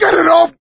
scream while pounced, coach; voice; source engine; left 4 dead 2; survivor

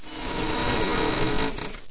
forest bridge; ambience; Source Engine; dod

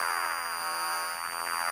hum; Source Engine; Portal 2

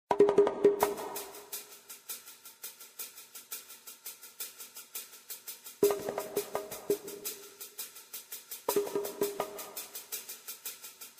music; faster than a speeding bullet; source engine; tf

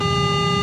hud warning; Source Engine; tf

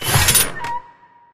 button down; video games; buttons; source engine; portal2

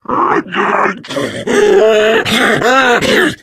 charger lurk, voice idle

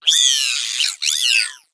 woohoo; player

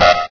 warn

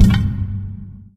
lightbridge, footsteps

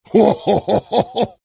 laugh evil; heavy; source engine; tf

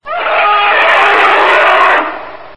monster scream; video games; Source Engine; docsounds

